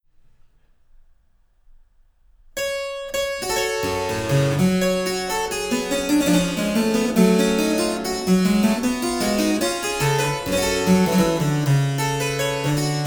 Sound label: allemande werkmeister; buxtehude